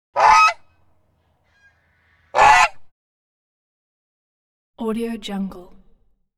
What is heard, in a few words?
geese honks